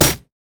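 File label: siegerider basic attack; minions; LoL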